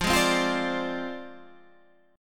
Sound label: Ab 6th Flat 5th F